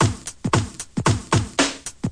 113 startup startup snareme, drum loops and breaks